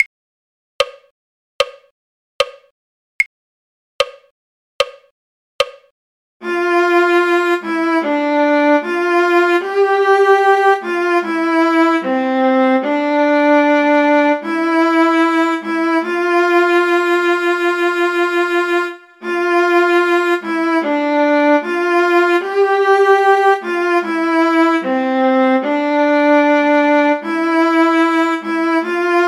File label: viola; folk